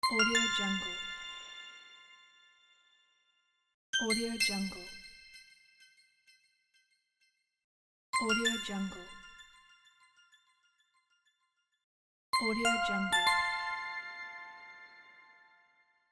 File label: bells